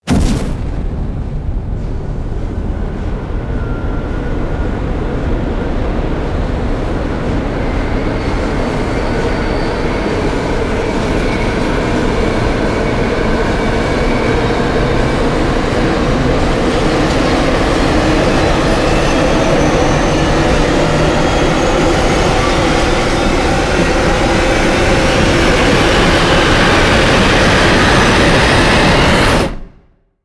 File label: riser; death train